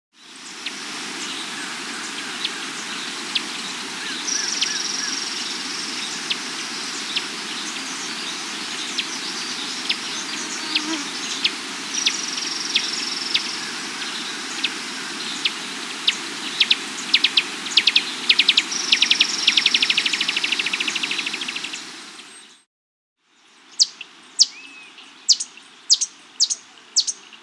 Common Bush-Tanager (Clorospingus Ophthalmicus), Andean Birds; S. America